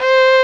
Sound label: Violin (C4); X-Wing Demo; X-Wing Patches